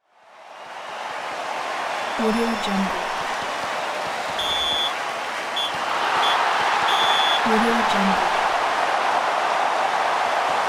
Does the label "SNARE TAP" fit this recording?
No